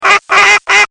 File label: jars; MOBICLIC63